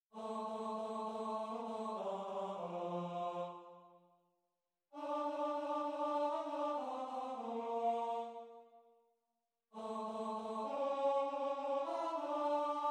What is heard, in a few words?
tenor; Hevenu shalom